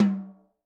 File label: Jazz Hi, Tom, Yamaha DTXpress-II